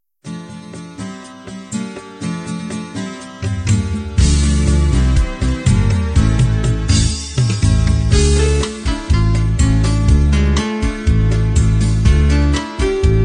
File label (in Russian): Неизвестная красивая музыка